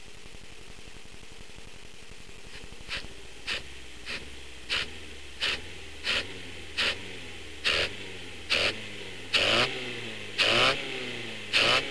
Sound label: advanced full noise no load